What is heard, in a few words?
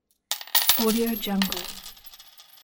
coins dropping; lazyboysproductions